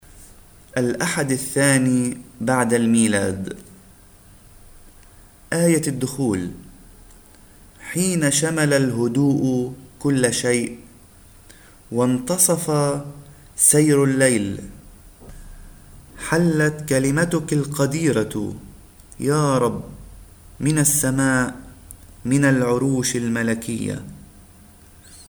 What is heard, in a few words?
Entrance Antiphon; 2 Sunday after Christmas